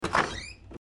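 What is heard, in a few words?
open door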